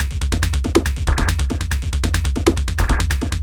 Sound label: Loops 140 BPM Hihat Percussion Loops